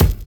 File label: VINYL BD, Drums Dance & Groove